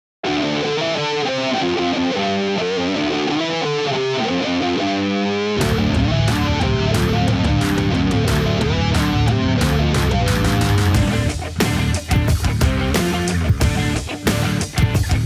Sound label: FUZZ POWER; MUSIC SCULPTOR